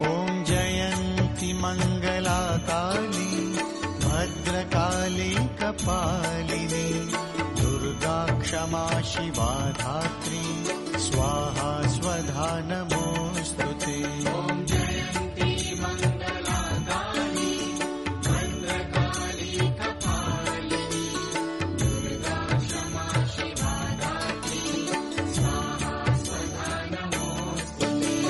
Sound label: mantra mahakali